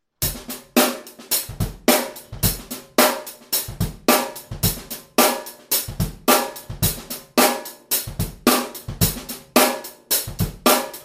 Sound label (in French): rythmes incontournable, batteur